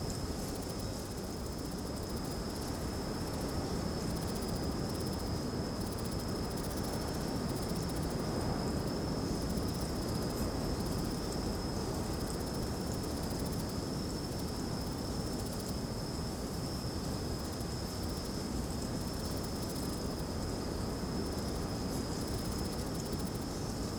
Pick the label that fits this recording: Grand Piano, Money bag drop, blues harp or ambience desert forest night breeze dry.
ambience desert forest night breeze dry